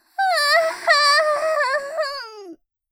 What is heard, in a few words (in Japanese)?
"はああああん！", アナウンサー; ハイグレボイス集